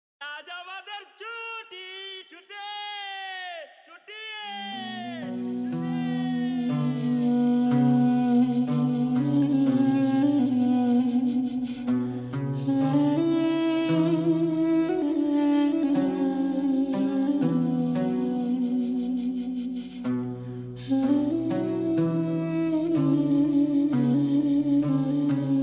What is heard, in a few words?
Aaj Amader Chuti Instrumental; kolkata; Nobel Chor